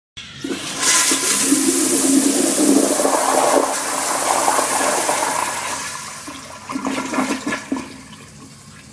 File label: Toilet Flushing